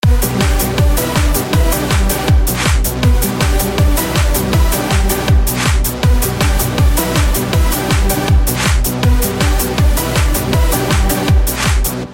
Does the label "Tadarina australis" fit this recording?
No